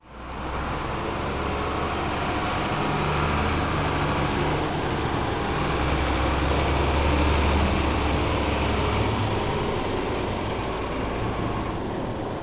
transportation truck skip lorry reverse into position to unload